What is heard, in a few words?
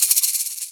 SK Plast FX, EMU Orbit-3 drum machine; 300 Drum Machines